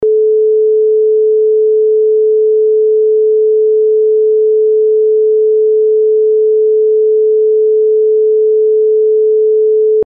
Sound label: 433 Hertz